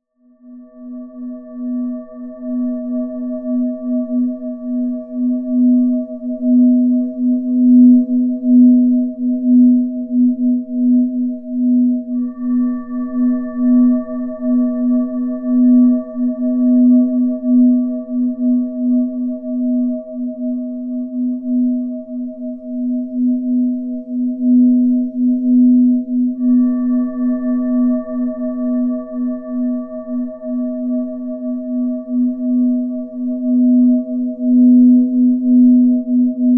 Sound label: Chakra 7 tone